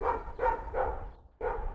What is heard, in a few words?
dog; Mafie 1